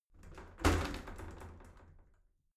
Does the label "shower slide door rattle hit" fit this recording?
Yes